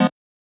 Chord

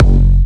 Kick

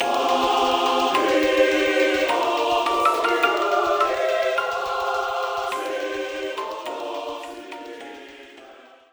fade out bgm

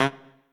Trumpet melo

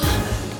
Hit; 音MAD; 转生成为了只有乙女游戏破灭Flag的邪恶大小姐

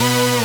synth

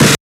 breakbeat

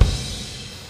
Crash; BanG Dream

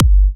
Kick

